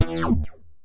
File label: Synth Hit E; Zero-G Trance Inducer; 90 s Sample CDs